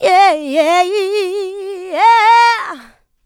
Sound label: vocal house adlibs; PS-28 Vocal House Samples